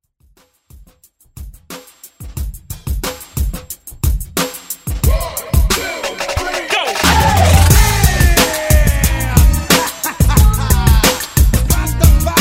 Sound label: Old School Redrum